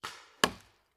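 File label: Paintball Gun Impact; Sound Ideas; Guns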